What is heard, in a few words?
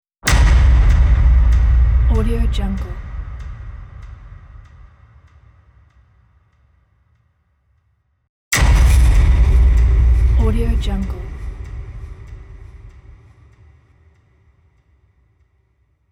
trailer impact sound effect